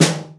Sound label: Snare Soft